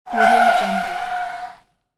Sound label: auto tires squeal; audio-tree